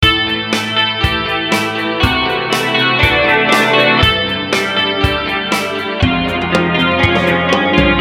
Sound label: depressing rock music